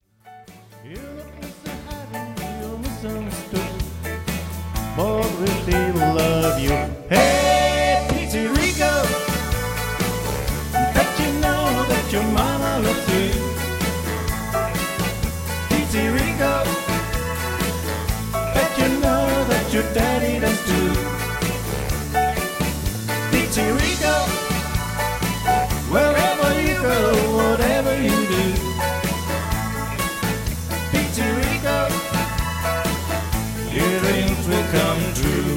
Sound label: pizzirico